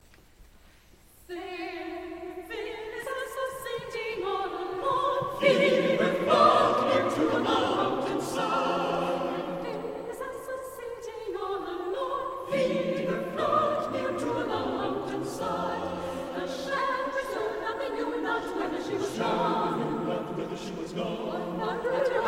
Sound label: Fair Phyliss I saw sitting, Choeur; Choeur Louisbourg